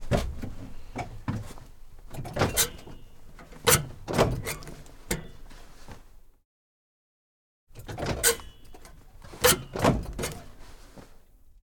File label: black hawk parking brake, Black Hawk UH-60M; MKH418S; Pole Position